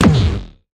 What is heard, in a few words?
railgun gunshot; Factorio